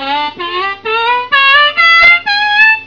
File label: bend up quick